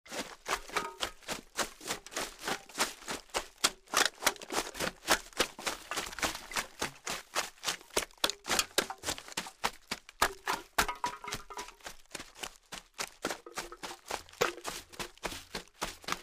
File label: Trash run